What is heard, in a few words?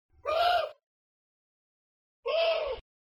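Long-eared Owl heh hhh x2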